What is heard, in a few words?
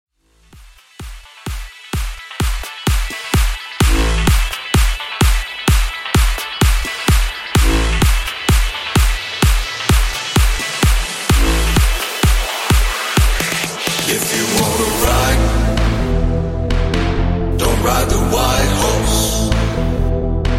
House 128bpm